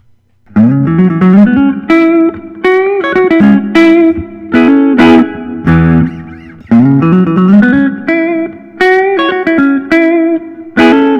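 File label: collings i 35 deluxe quilt, guitar; iced tea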